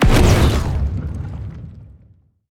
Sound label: heroes void Rift cast; therien